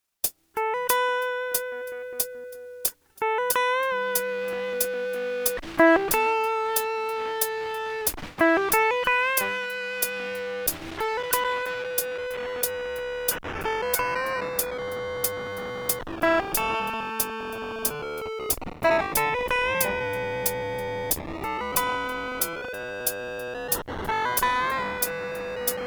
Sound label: Boss RC 202 git lofi bit crusher